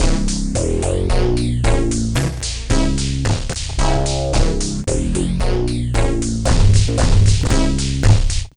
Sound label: Industrial sludge; Sonic Foundry (Sony Creative Software)